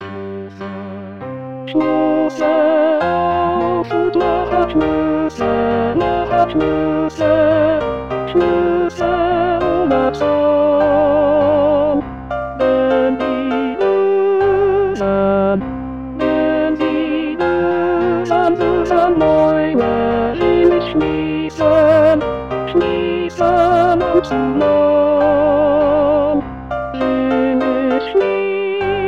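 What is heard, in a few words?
tenor; Jubilate; Brahms Waltz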